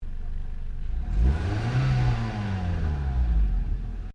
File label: Rev Exhaust, car; Hyundai Accent 2008; Hyundai hire car; Vehicles